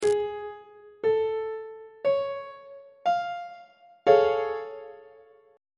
b double flat augmented major 7th 3rd inversion on treble clef